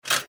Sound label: reel stop; Step Back 7's